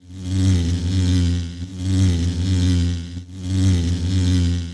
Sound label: Killer Bee Idle; Seer; GamigoNA